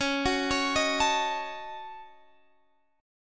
D sharp Minor 7th Flat 5th C sharp

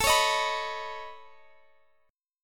C sharp 7th Suspended 2nd Sharp 5th A

